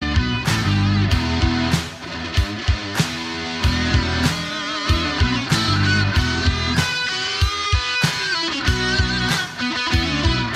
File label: rock and roll